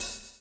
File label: swing